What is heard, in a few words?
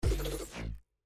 Rock; Qiyana